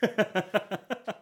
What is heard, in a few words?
laugh